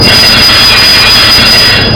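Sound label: Stop Brake Snd Kiha40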